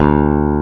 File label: W BASS D2; AKAI S-Series CD-ROM Sound Library VOL-2; MX.BASS